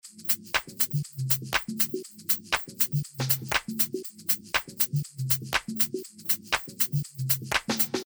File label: drums dry